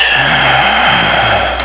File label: Exhaling; Soundsation; Human